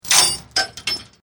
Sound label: Chain Metal Clanging